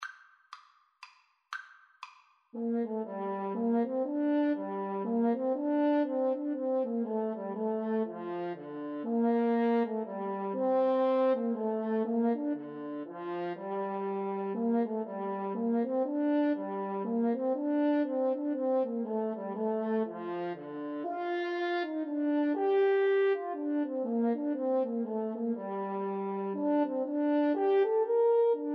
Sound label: French Horn Duet